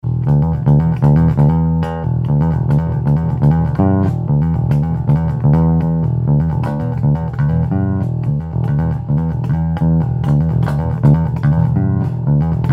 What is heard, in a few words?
bass loop